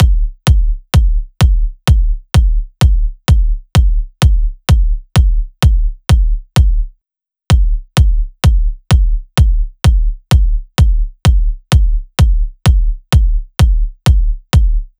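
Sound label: Loop 128BPM bass drum; VEC5 Drumloops; VEC5 Multi Loops 128BPM; Loop Elements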